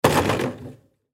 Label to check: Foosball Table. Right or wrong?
Right